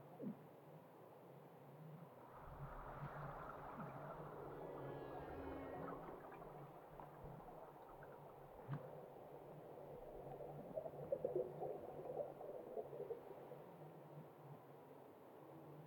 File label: ambience General; netent; luckyangler